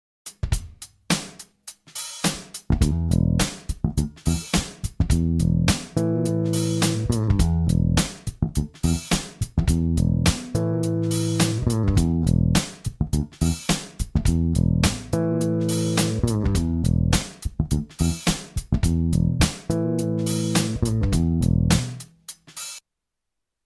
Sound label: Em with bluesy fill